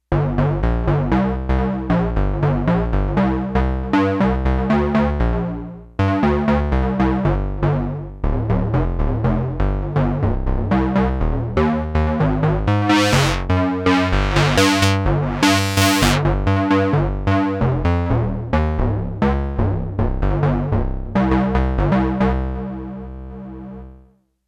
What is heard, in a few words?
Phase Bass, Arturia MicroBrute; Factory patch sheets